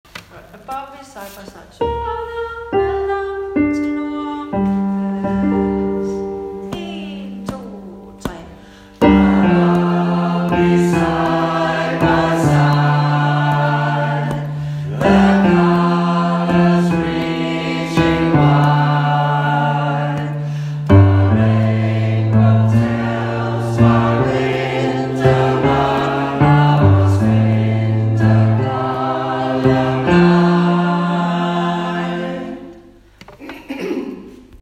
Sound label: Hymn BAS